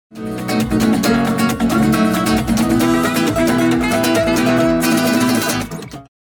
scale

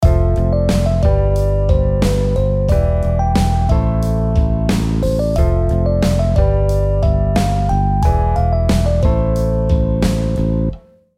chord melo

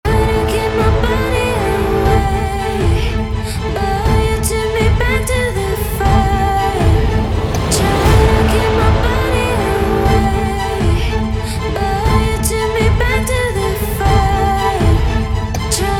Komplete Orchestra

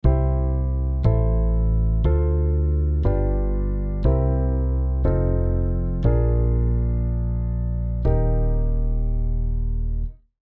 C → Am → Dm7 → G7 → C7 → F7 → Bb7 → Eb 60

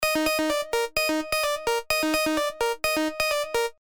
synth intro

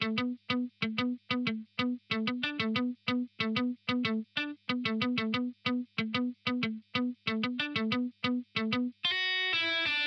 EG Single; Ample

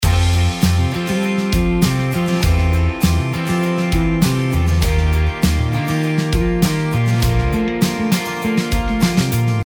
kick attack

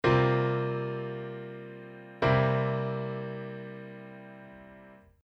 C7sus4 C7

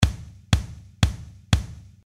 kick 700ms

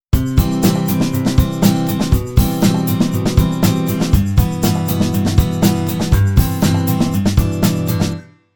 real guitar backing

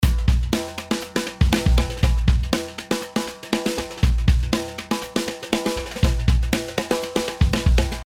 drums after

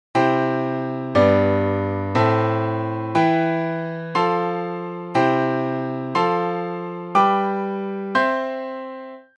orchestration

